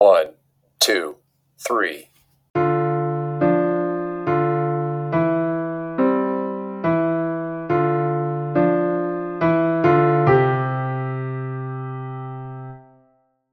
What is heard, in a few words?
Waltz for Two Hands 70 BPM